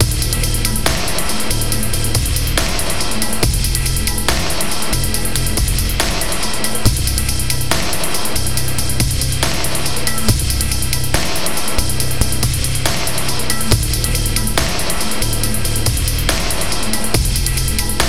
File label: drums